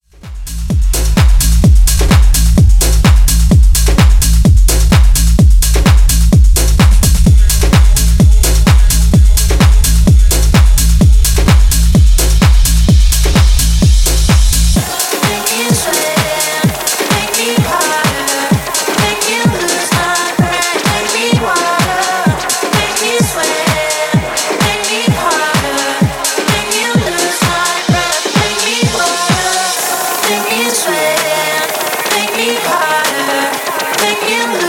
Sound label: Tech House 128 Bpm